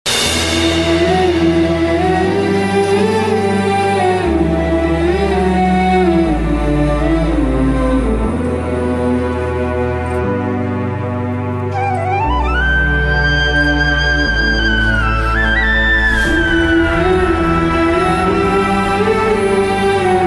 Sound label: love bgm emotional background music; theme; kabzaa